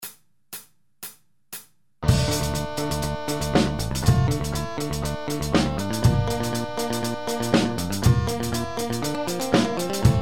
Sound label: drum scratch